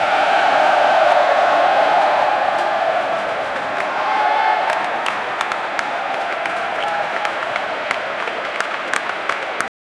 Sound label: soccer fans cheering in s, stadium